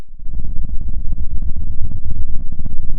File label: pop, Sound of a dinosaur